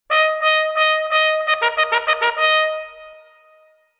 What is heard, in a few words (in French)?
Sonnerie Commencez Le Feu